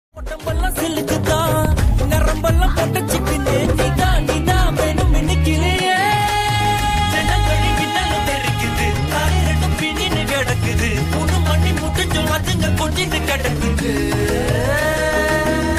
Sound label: song ringtone; dude; pradeep ranganathan; mamitha baiju